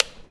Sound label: beep